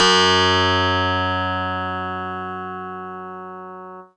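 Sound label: Harpsichord DX100 F sharp 2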